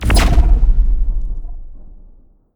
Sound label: mini uav